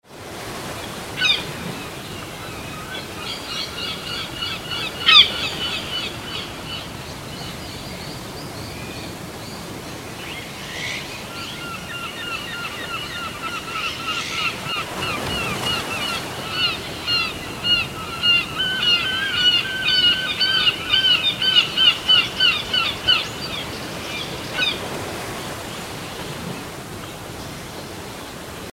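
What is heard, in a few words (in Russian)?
чайка кричит на фоне шумных прибрежных волн затем несколько чаек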